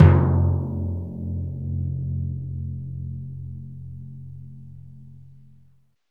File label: PRC TIMP F, Timpani Dry; Roland LCDP03 Orchestral Perc